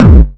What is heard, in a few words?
Hard Kick